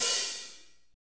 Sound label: HAT, Roland Fast Rock Hi-Hats